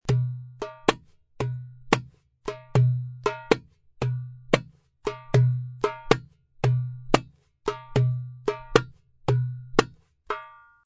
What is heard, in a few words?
aksak semai slap